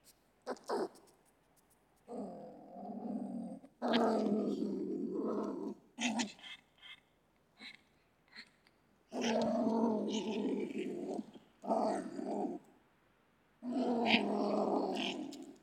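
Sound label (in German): Steinmarder Geräusche, Wildtiere in Europa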